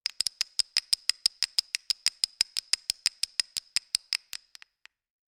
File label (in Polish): Bębenek drewniany mały; Instrument chętnie wybierany przez dzieci pobudzający je do grania, wykonany w całości z d; perkusyjne; Stuk i Puk - Dziecięce Instrumenty Muzyczne